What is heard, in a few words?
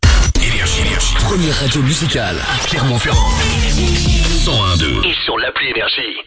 JINGLE